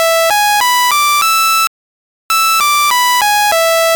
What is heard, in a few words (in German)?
Klingelton